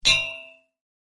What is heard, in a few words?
coil Bump Low; faradays law